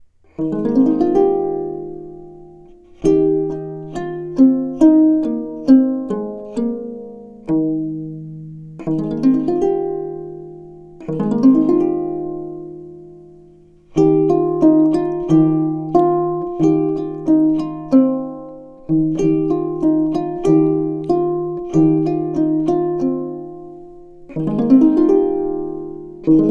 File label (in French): lyre gauloise